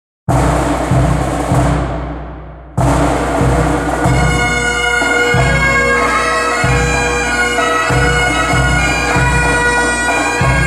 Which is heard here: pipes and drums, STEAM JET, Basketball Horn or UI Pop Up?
pipes and drums